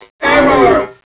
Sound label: pain; hunger; franklin